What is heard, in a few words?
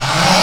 Rev Right, dry perc; Quasimidi Rave-o-lution 309